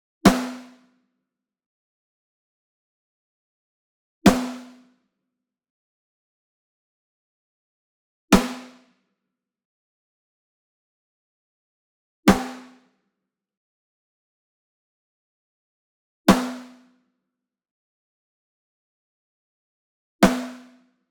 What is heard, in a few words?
SNARE Ludwig Black Beauty Hit Rim Near; SINGLE; boom library; Cinematic Strikes Construction Kit